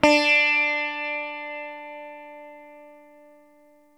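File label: SITAR D 3 left, coral sitar, guitar; East West; Steve Stevens Guitar Samples Collection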